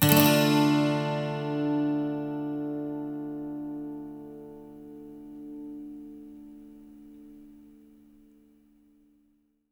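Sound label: 12 string minor, Acoustic Guitar Loops; USB Soundscan vol.04; AKAI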